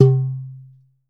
SKINNED UDU

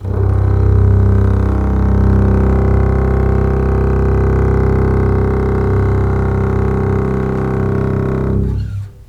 LEG MF L, The Ultimate String Collection; East West

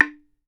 BAMBOO, World Assortment

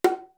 R8, PERC; Greytsounds VOL-1

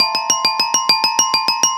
Pelog Demung Hi; Melodic; Ambient-Melodics-II

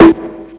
SN, S. DRUM, House Side Of 2 Step; USB Soundscan vol.51; AKAI

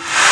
Reverse FX

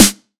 909 Snr Sweet, Tape 909, SD; Goldbaby Tape808 & Tape909; The Tape 909

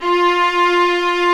STR, Vlas Sect, Agitato

